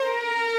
violin

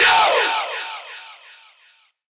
vox

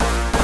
synth

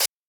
cymbal

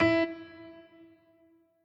piano riff intro

